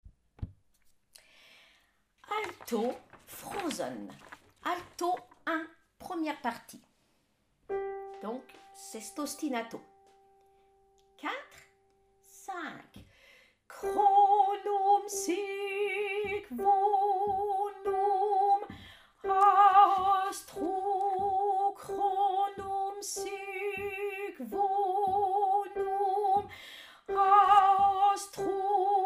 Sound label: Alto; Ensemble Vocal Chanteval